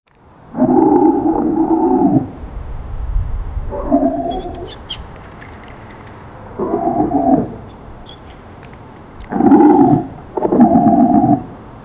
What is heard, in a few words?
lion eat skeloton; animal-behavior